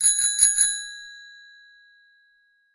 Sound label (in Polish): dzwonek od roweru - dzwiek